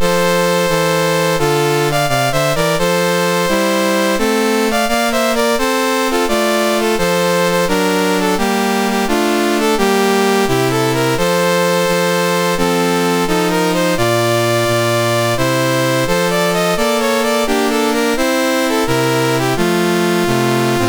MOS6581R3, National Anthems; Pahang; Dave Marquis

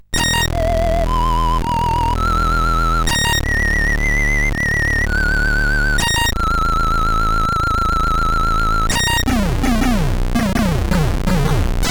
sid CSG8580R5; Last Space Fighter

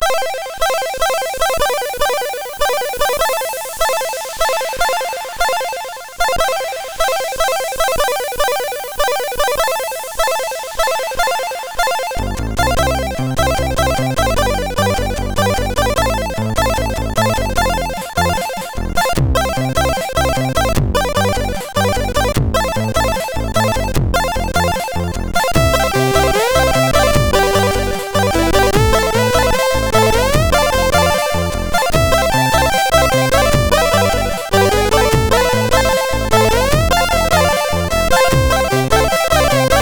Intro, sid MOS6581R2

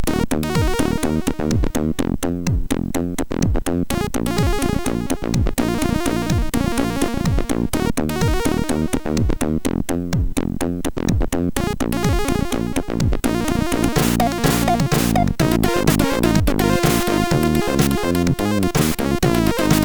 sid MOS6581R4; Vibrations tune 6; hvsc

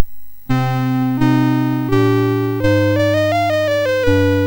Renaissance sid MOS6581R2

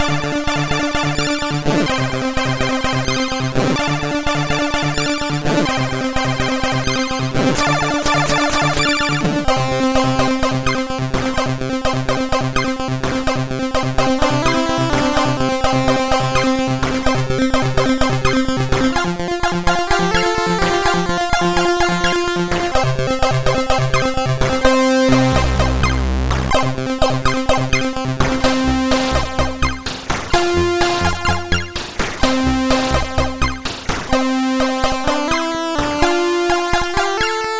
Mr Rap 2, MOS6581R4

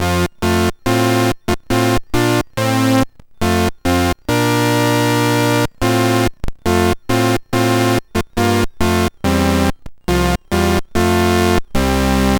BASIC, CSG8580R5; Commodore; C Users Guide V2 BASIC; hvsc

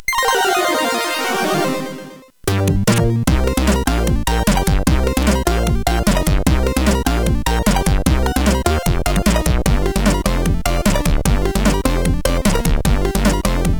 CSG8580; Youthful

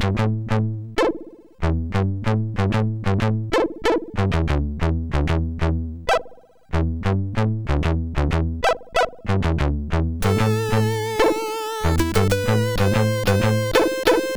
Lazy Tune sid MOS6581R4

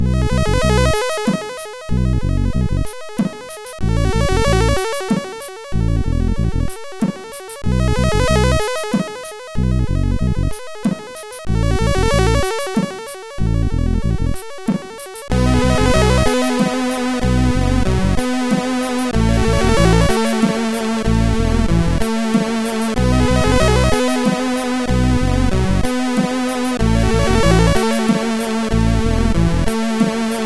Music, MOS6581R4

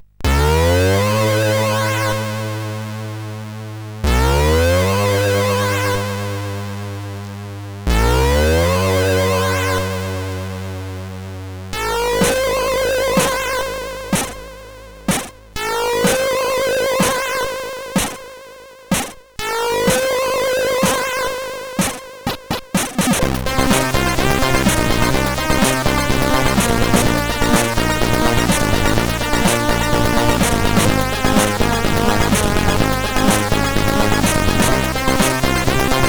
Loader, CSG8580R5; Ocean Loader 5

